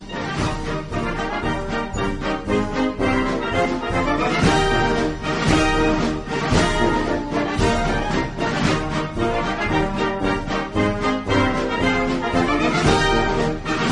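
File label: dixie, ringtone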